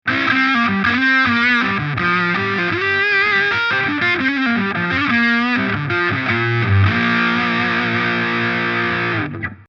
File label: Straight Flush stacked Gain Max.